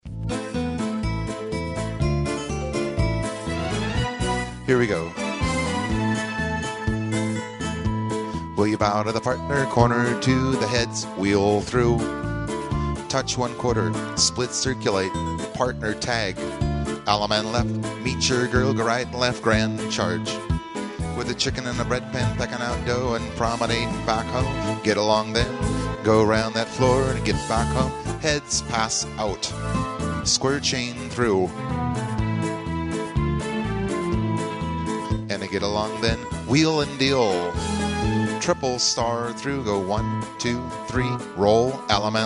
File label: vocal sample